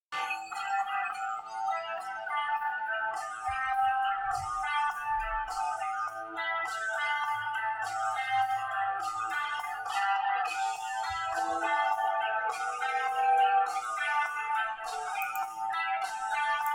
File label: bells